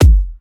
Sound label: Kick A